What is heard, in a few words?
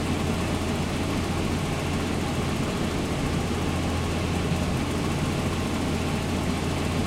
maus engine rpm, engines tanks; War Thunder